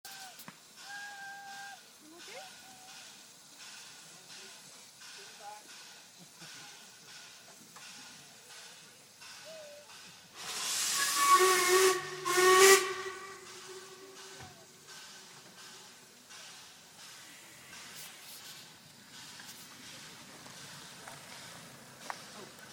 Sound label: sound of tooting santa special narrow gauge railway train; tilford rural life centre